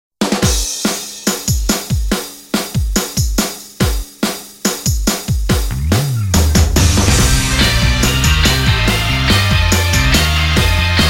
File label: Full Japanese